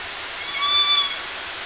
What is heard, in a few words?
Kitty Call, killer whale